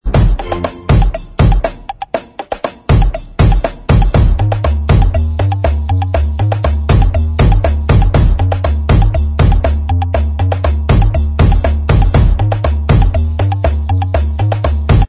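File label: instrumental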